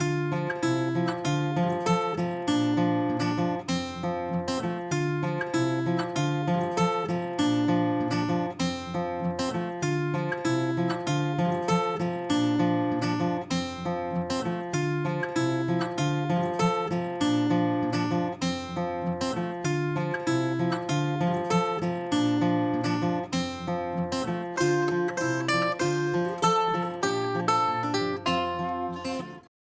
instrumental; Pony Music Archive

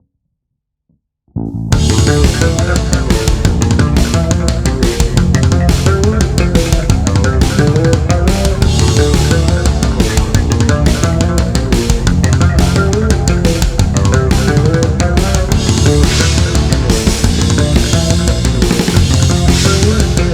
Cheerful, Soul Strings; Pony Music Archive

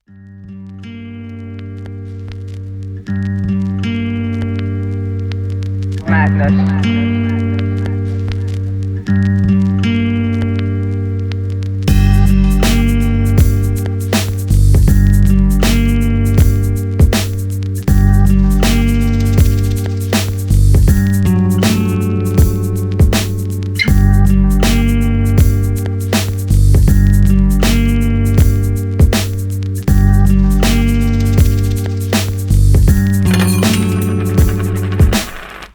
instrumental; Pony Music Archive

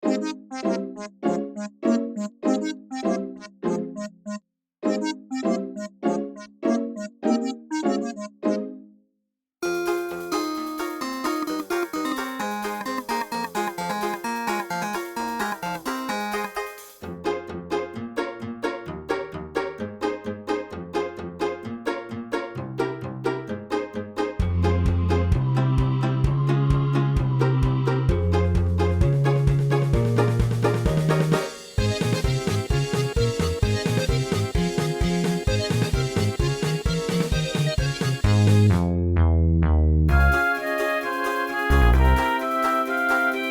instrumental; Pony Music Archive

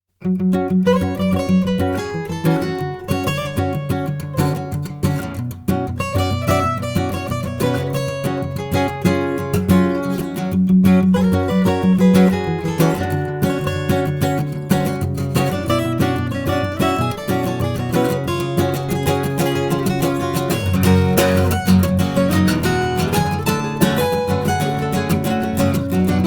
Acoustic Theme; Pony Music Archive; Rainbow Dash

